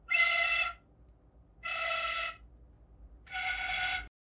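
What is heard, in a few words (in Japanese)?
shinobue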